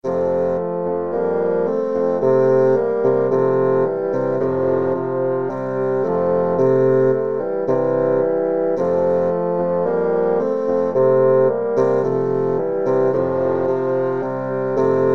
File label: bassoon quartet